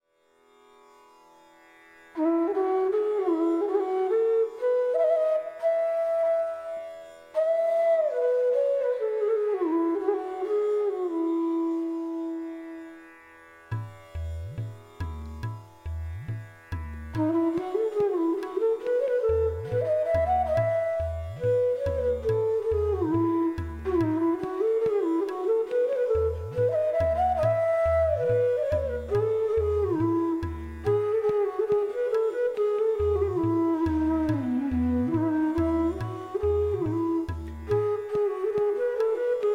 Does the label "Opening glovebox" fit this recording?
No